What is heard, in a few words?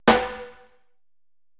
PERC MIX